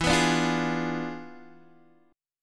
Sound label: E 7th Flat 9th E down Guitar Open D